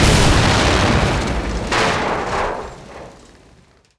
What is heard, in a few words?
super heavy battleship attacked; Hearts of Iron IV Death or Dishonor